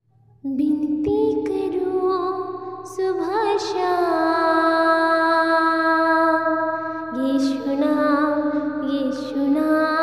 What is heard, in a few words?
devotional ringtone